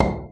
Clangy Crunchy Foundsound Kit

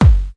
prophet Kick; Hip Hop; STYLE KITS (Hip Hop, Etc)

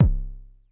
kick, drums single hits; iElectribe Kicks

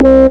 202 hit, synth; 202 synth construction kit

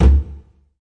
djembe big hit; ETHNIC and WORLD PERCUSSION

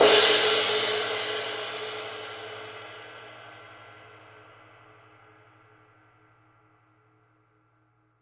big ride crash; single hits; Big Believable Rides

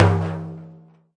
Arabic percussion; Arabic Percussion Kit 2

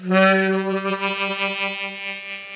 harmonica note; Harmonica Multisampled

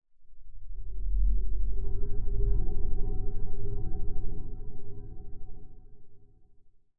Nostromos Find G0, dark pad

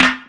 hollow snare; single hits

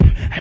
lofi vox break, BeatBox Male

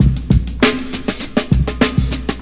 classic break, DRUM LOOPS and BREAKS; VIBRIS CLASSIC BREAKS

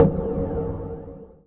Fracture Perc; ModeAudio Found Percussion Hits

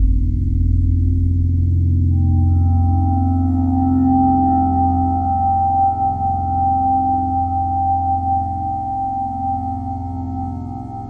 deep inside the belly pad; LOOPING AMBIENCE

